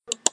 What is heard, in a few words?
switch; 8800 simulator